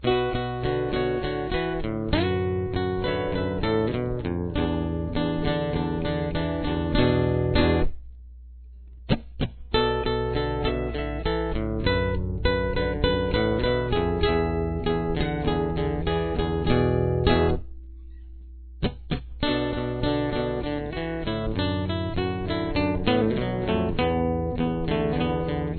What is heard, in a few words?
solo